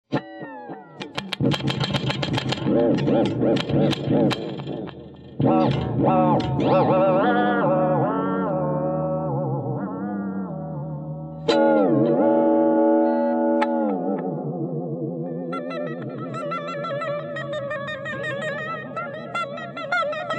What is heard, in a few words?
basslab guitar jam